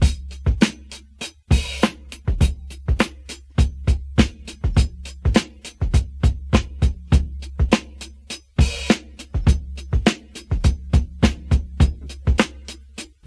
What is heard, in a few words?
102 bpm old school funk breakbeat c sharp key